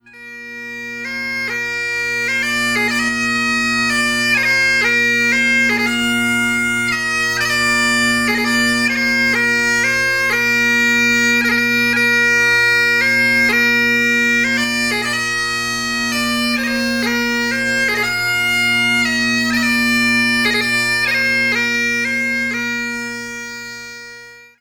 Hyferdol, bagpiper

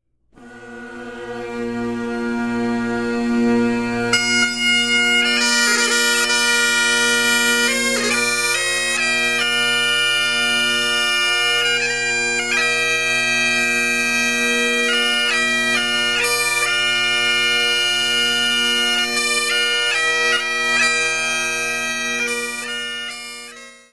Duet; Shenandoah

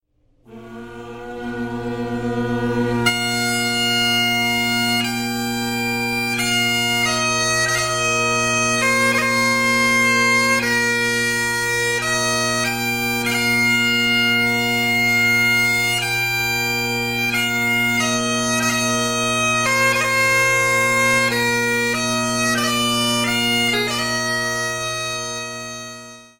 Nearer My God, bagpiper

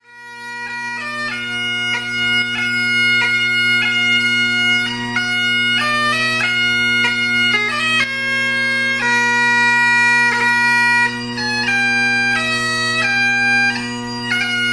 Marine, bagpiper

